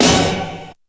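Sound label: SNARE SHOT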